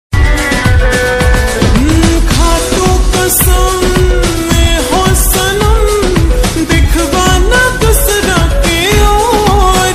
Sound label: viral song